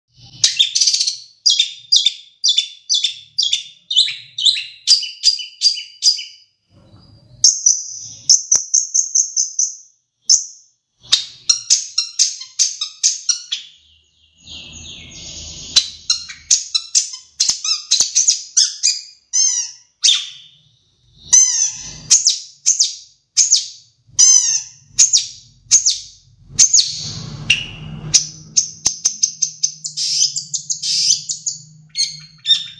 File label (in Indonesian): Suara Burung Cendet Gacor Durasi Panjang